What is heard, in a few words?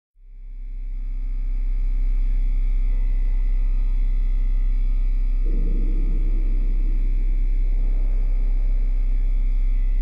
The Backrooms Ambience